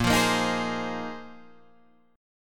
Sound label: Bb 9th Suspended 4th Bb down Guitar Open D